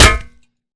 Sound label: Imp Bullet Light Ballistic Solid Metal